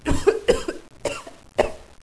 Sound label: cough; hostage